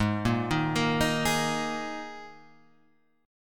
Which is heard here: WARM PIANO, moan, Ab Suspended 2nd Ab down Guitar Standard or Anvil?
Ab Suspended 2nd Ab down Guitar Standard